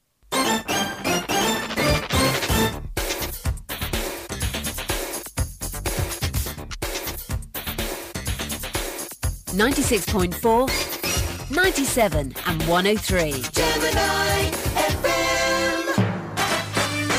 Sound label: news jingles; Gemini Reports